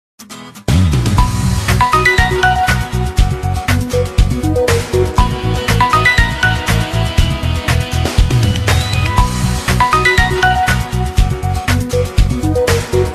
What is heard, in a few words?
Samsung Galaxy lawas ringtone; nada dering Samsung